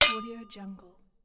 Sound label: resonating metal impact